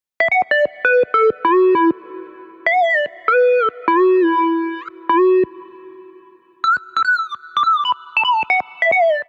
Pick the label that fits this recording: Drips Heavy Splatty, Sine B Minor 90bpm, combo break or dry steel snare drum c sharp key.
Sine B Minor 90bpm